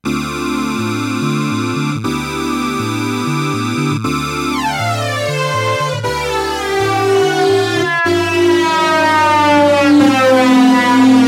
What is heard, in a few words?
Sawtooth Synth Mooer eladyFlanger Manual Filter